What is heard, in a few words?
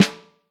Vintage Snare, Snare Drum; Q Up Arts; Johnny.C's Vintage Snare Drum; 6X14